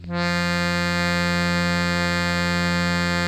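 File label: accordion F2 double; InVision Interactive; Lightware VOL-1; Instruments & Percussions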